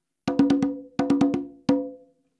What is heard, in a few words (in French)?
frise, congas